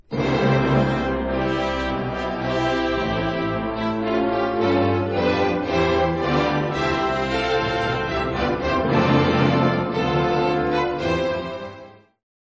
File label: orch